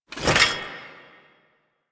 Generic Foley; Warframe; Hoplite

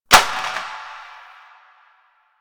Plastic Drop; Warframe; NineteenNinetyNine

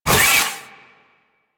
Kick Whoosh; Warframe; Venus; Venus Terra Moa; Abilities

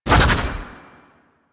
Air Spin; Tonfa; Boltace; Warframe; Tenno

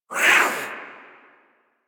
Feral Vocal Attack Short; Warframe; Catbrow

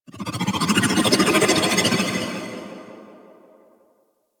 Mountain Pass Sculpture Contract; Warframe; Ambience; The War Within

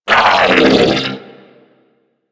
Corpus Scream; Warframe; Amalgam Override; Abilities

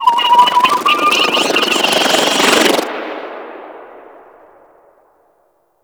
Infested Golem Beam Charge Up; Warframe

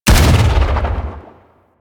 Rifle Shot; DE; Warframe; Weapons; Sentient; Sent Rifle New War

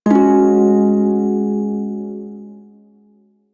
Prime Shawzin Yo Chord G; Music; Warframe

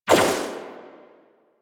Guardian Shell Impact; Warframe; Focus; Vazarin

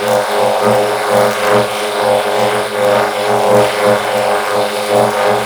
Energy Beam Loop; Warframe; Ambience; Clan Dojo; Dojo Misc

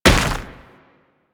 Step Dirt, Footsteps; Warframe

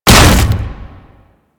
Hammer Impact Wood A; Warframe; Weapons

